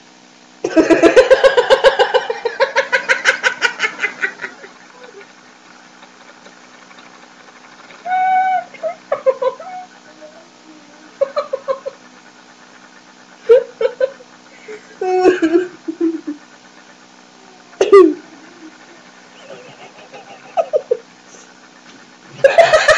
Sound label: laughing girl